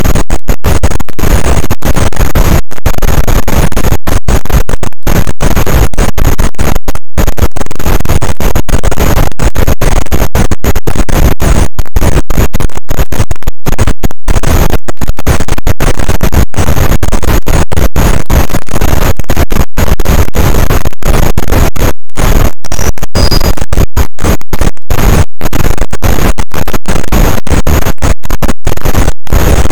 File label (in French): cachalot positif, boat; Physeter Macrocephalus